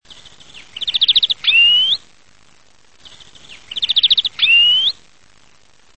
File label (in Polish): dzwoniec - szczebiot i nawoływanie